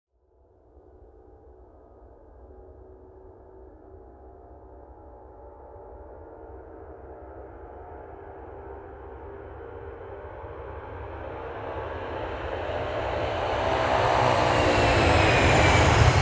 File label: Airliner Approaching Lifting Off